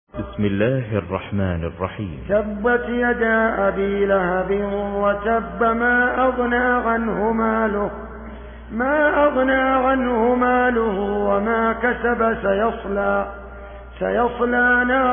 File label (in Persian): ترتیل بروایت حفص عن عاصم; سوره مسد